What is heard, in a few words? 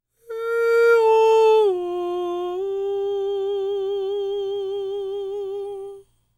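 falsetto, Vocal Planet Jazz FX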